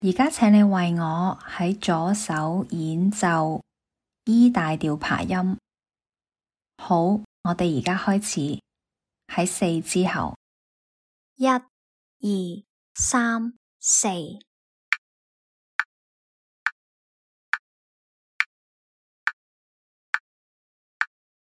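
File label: E major arpeggio left hand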